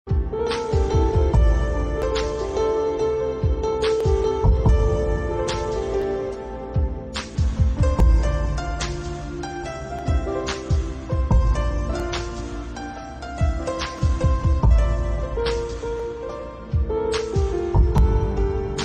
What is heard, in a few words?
instrumental ringtone